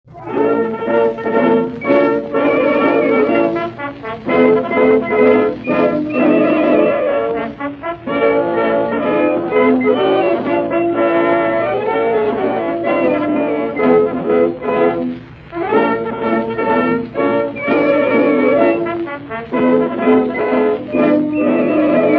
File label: Marsch St.-Petersburg Grenadier Regiment; russia